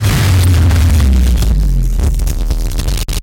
production element descend dark, Distortion Glitch; PremiumBeat